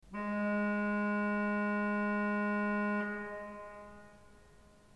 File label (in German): ton, orgel